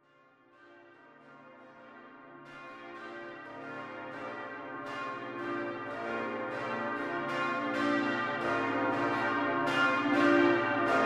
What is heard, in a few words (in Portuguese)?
Sinos da Igreja do Mosteiro; Oriental